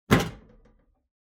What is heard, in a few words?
Ceiling Light Pull